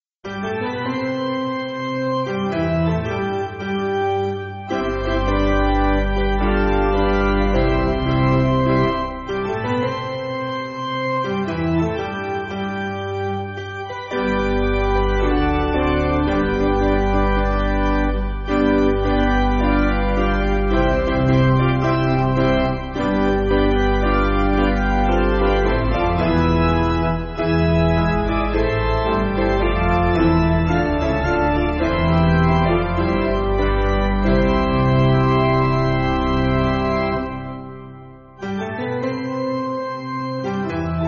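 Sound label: Piano, Music Backing Files for Churches; McGranahan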